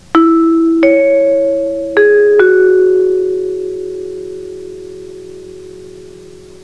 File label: chime